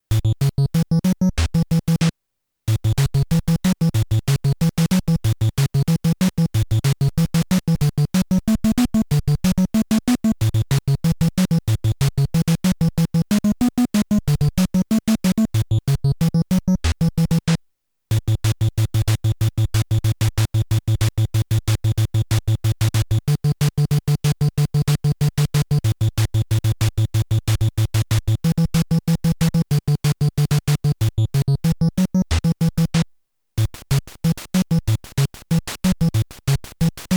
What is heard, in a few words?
(PAUSE) (NES); Snake Rattle n Roll; Reptile Rocks